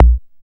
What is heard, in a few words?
BD ANALOG, Synth; Sound & Vision - Gigapack II CD 2